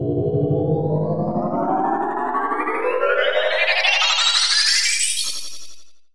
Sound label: HELIX